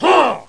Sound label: huuh; Tempra Vision; prosonus